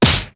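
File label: punch big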